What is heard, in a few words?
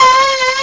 PIANO mod; PT Support archive